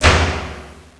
door slam; tams quad

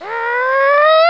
cannonball

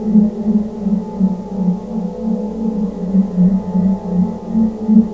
force speed loop

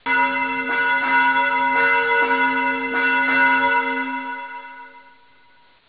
bell peel

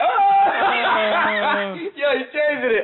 laughter

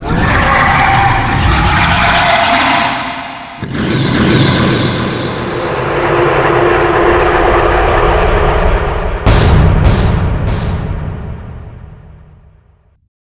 ghosts begin; egyptesc

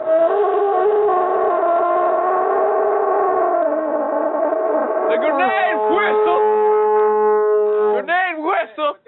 grenade whistle

